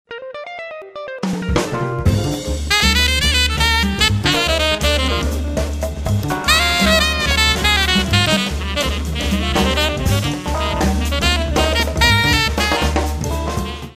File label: Jazz bar; Samsung SGH-T369-Linx